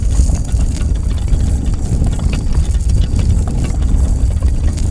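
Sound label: walls Move loop; Reaper; Star Wars Clone Wars; XBOX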